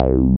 BASS MONO

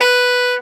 A SAX POR B3, Alto Sax Portato; Orchestral; VarazdinOrchestral

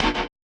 Perc, drums; One Shots; Percs; Touch Loops; BlissedOutBeats

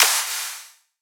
clap snare; Electro; drum hits; Anthemic

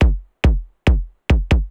KICK TECHNO 140, Techno Drum; Techno2

